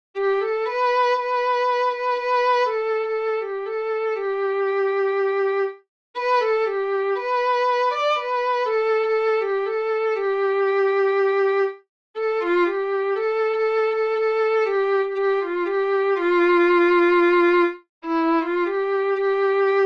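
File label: violin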